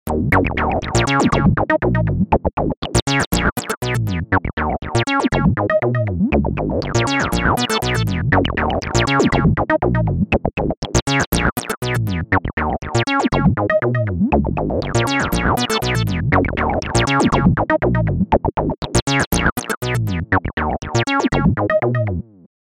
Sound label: classic acid; abx3 by audioblast